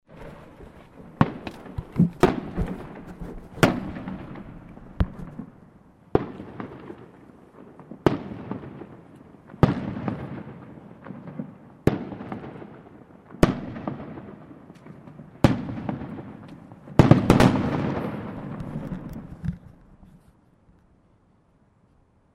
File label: fireworks - home; fieldrecs